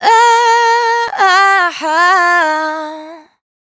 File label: riff Quick Run 105 Eb, Dry Vocals; Raw Vocals; Slate Digital Omnivox Vocal Sample Pack